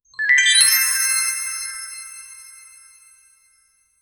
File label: create a sound, promotional